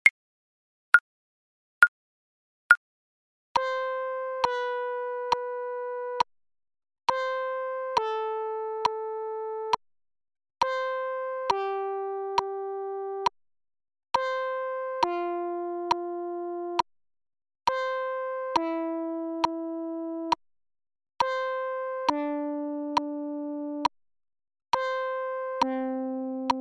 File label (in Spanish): Entonacion Intervalos Escala Mayor Saltos